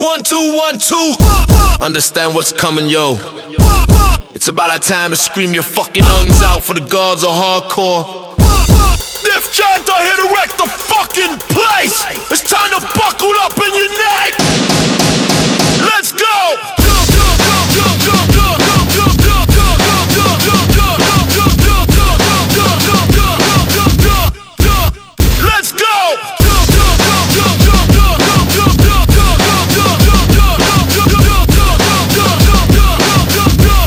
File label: Buckle Up 100bpm